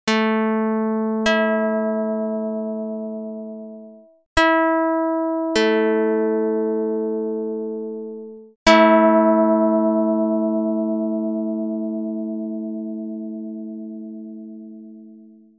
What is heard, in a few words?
pluck; dr220